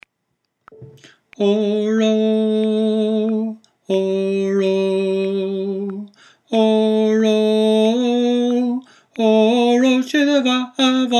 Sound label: Tenor; Oro Se do bheatha bhaile